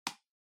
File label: PC Mouse Far Toggle